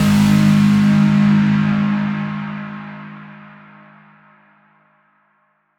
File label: Stinger Impact Blink; Curved Space